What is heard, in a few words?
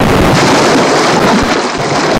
Auto Crash Water, car crashes